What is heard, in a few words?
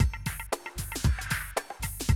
Classic Drum Loops